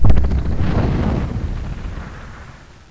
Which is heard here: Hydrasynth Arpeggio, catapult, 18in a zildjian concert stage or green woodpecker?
catapult